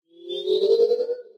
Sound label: robot synth; Source Engine; Portal 2; robot parts